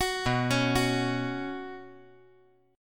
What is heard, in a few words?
B Suspended 2nd